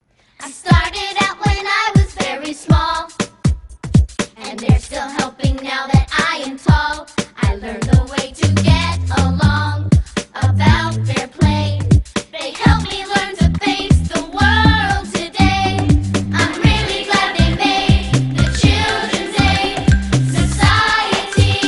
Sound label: jingle